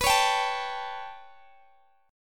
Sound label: B 7th Suspended 2nd Sharp 5th down Guitar Open D, chord